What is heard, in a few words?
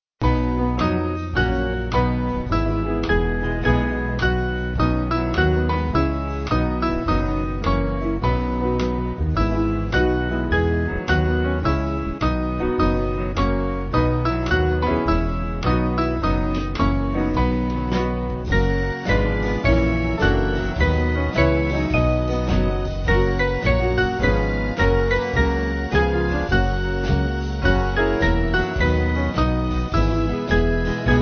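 Band, Music Backing Files for Churches; 4/B-C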